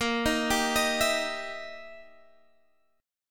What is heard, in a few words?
E Minor Major 7th Flat 5th A sharp